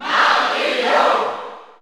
Cheer German; Mario; SSBU

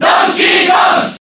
Cheer JP; Donkey Kong; Brawl

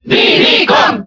Cheer Spanish; Diddy Kong; SSBB

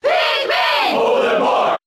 Cheer; Olimar; Brawl

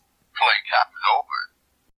Wiimote Sound; Wolf

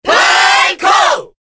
Cheer Korean; SSBB

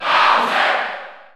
Cheer French; Bowser; SSBU

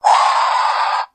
Wiimote Sound; Bowser

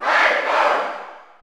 Cheer German; SSBU

